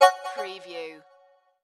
Digital Chime Signal Vox Click

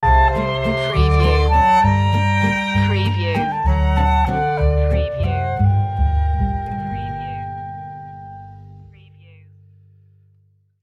jewish family sting

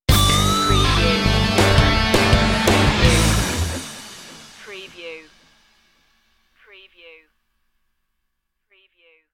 slam dunk stinger

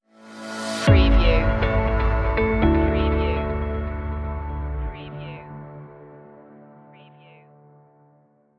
positive flow stinger

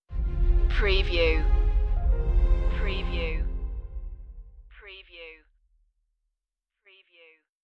falling angels stinger

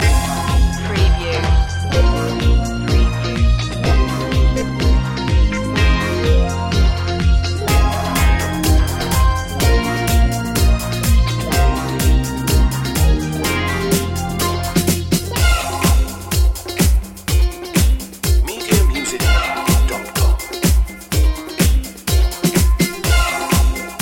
hot steps loop

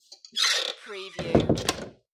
Close Door With Creak

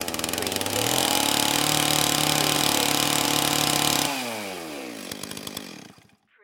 Chain Saw, Run, Turn Off

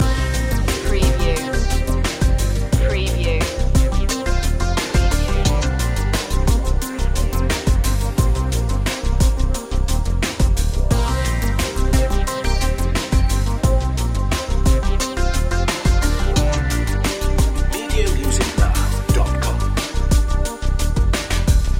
over distant shores loop

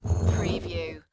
Stone Drag On Cement

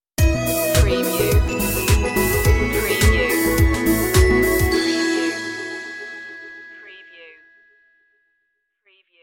boiling point stinger

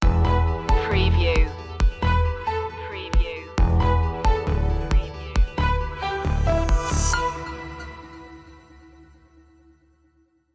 digital environment stinger

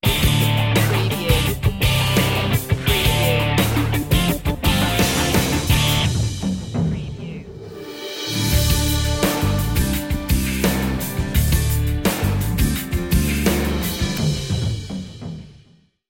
earth groove sting

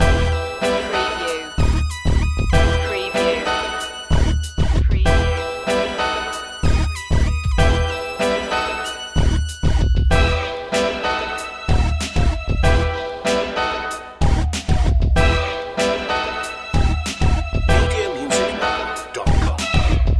hip hop loop

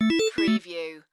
Casino Slot Machine Organ

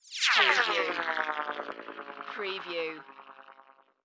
Scifi Production Element; Into the Unknown

